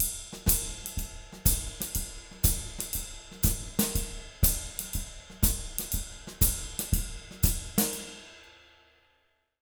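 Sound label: 120 SHUFFLE, Jazz Latin Drumloops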